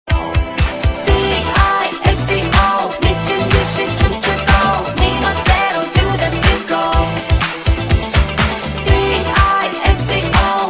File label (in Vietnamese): nhac audition disco